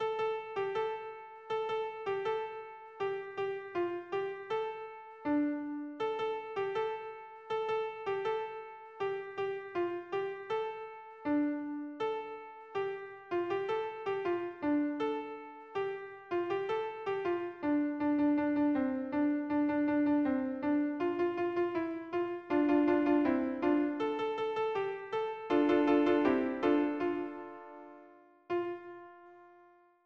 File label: harmony piano